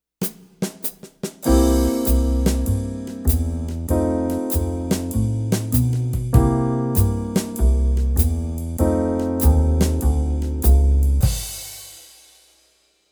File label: No Bass